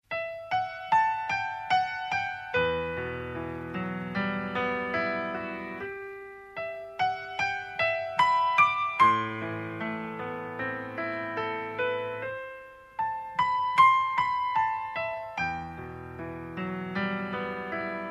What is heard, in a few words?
Sadness Sound